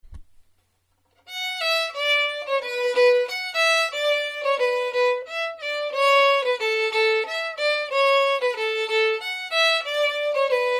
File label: Brian Borus March in D; slow irish